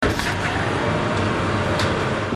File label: door